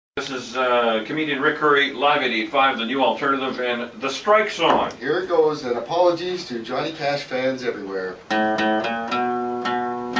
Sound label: Strike Song; OC Transpo